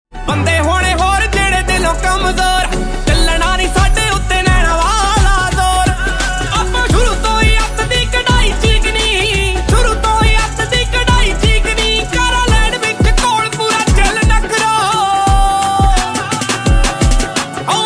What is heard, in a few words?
Punjabi Beats Ringtone